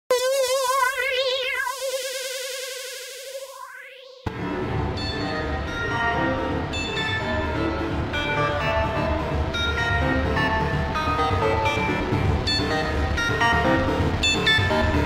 MINIMAL TECHNO LOOPS SAMPLE PACK

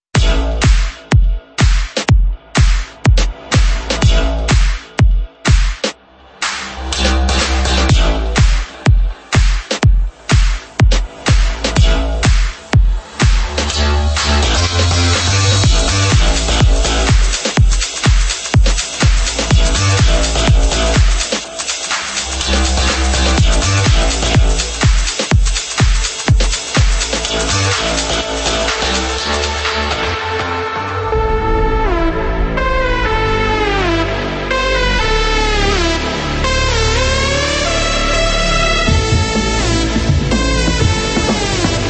Future House Construction Kit

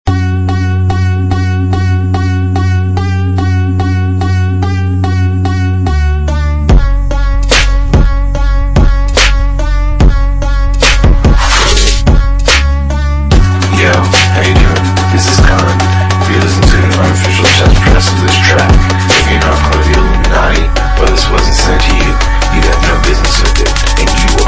Hybrid Trap; Naim Hakim JUTSU

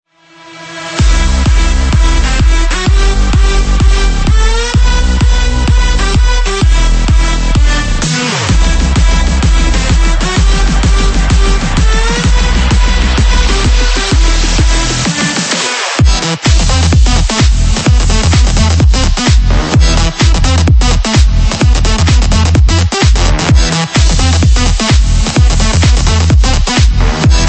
Big Room; Spinnin Sounds Big Room Sample Pack

